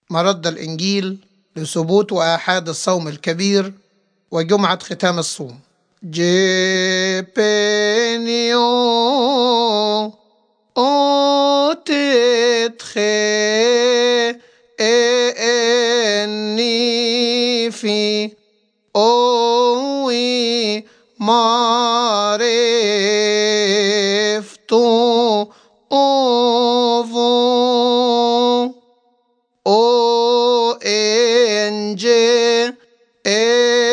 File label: Major Gospel Response